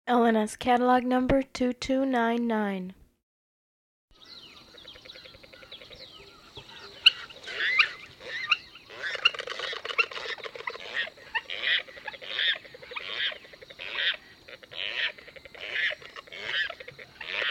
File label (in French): chant